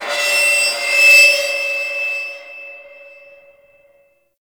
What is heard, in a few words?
High Metal Screech; Warframe; Tau; Ambience